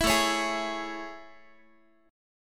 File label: A Major 7th A, Guitar; AM7; strummed; 19,17,16,18,x,x